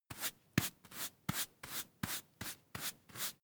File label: broom hand sweep short sequence